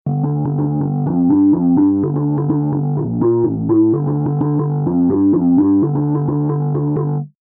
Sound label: vintage wah stick